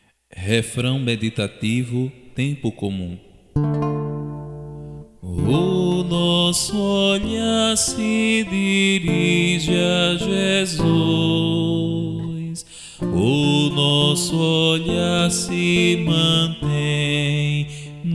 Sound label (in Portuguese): refrão meditativo tempo comum